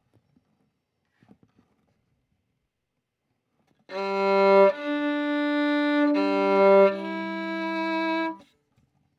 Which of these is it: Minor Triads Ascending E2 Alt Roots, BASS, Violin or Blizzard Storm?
Violin